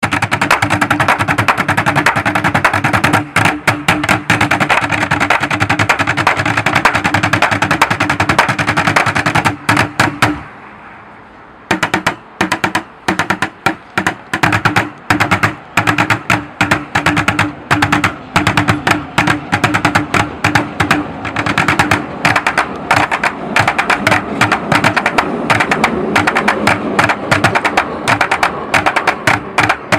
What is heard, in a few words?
bucket drum